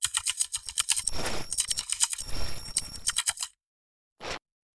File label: typing